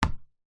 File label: handball bounce wood floor single; sport